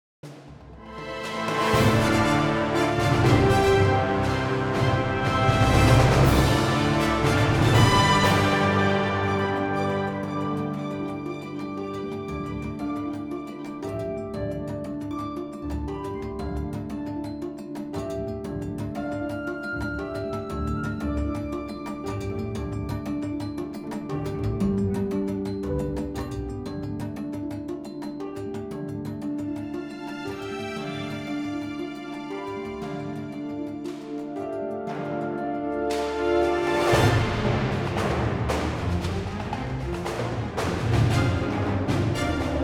Cinematic

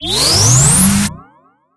Magic Orb Grow; Skylanders Cloud Patrol; Arcade, iOS, Mobile

